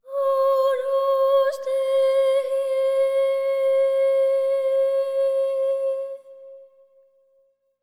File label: AGNUS BOY, BOY PHRAS, Symphony of Voices; Spectrasonics